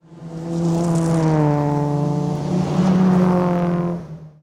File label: Engines; Rally Champ